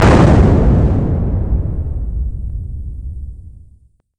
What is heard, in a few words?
explosion blast